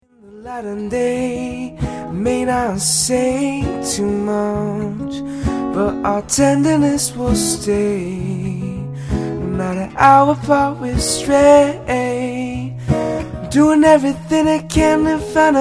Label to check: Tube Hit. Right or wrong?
Wrong